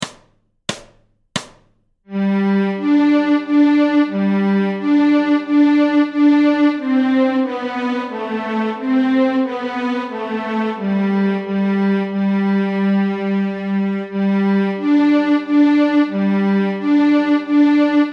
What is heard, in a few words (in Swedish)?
Stråk G, Fiol; Adams vals; Notträning